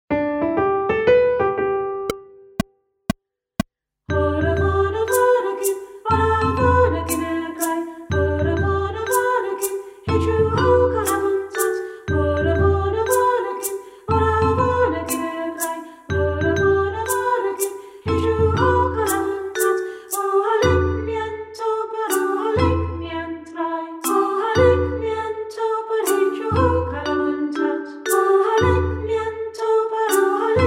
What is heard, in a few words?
Orra Bhonna Percussion for Classroom